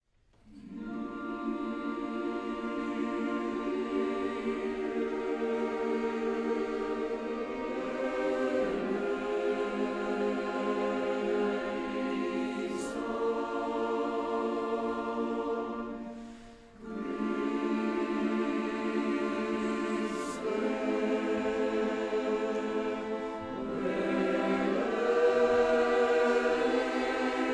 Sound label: liturgica kyrie